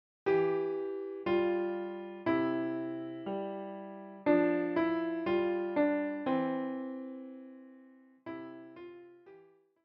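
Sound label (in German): Klavier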